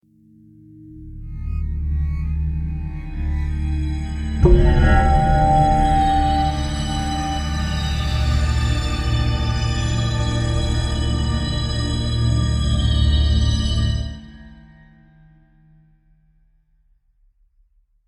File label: Dark energy, Tension 공포